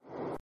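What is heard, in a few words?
city night loop; The Sims 3; Windows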